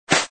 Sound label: Grass